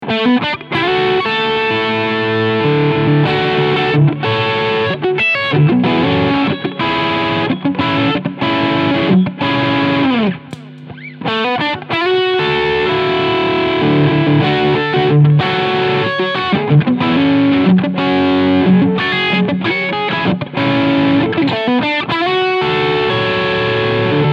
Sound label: Source Audio ZIO E Plex Overdrive